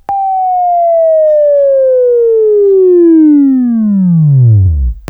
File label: WAV Right, Sweeping; Labview-Program; Sweeping Training; Buzz